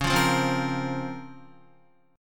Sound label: Db Major 13th, Guitar; strummed; JGuitar